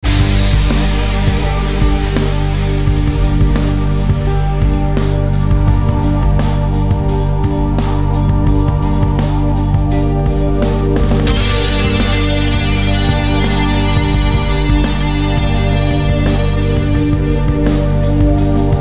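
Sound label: Blowing Forest instrumental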